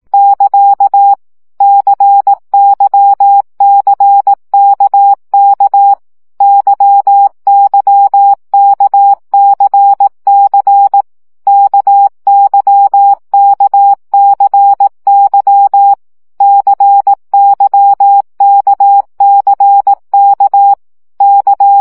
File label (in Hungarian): CW gyakorlas k c y 15 baud